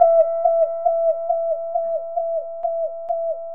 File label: Weird Droney Pan